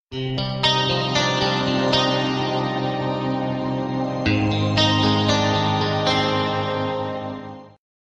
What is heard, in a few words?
Guitar Tone